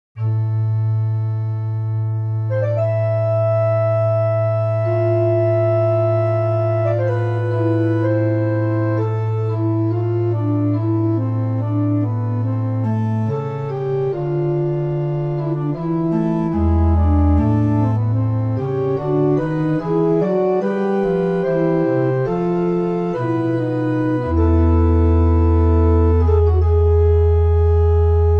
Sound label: organ